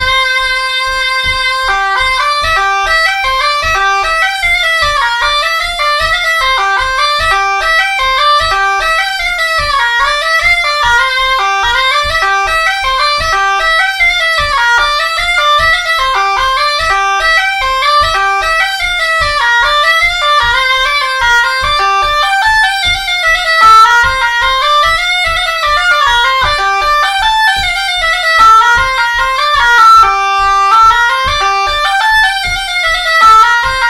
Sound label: mazurka